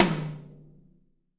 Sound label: TOM, Real-Kit; 90 s Sample CDs; Sound & Vision - Gigapack I CD 1 (Roland)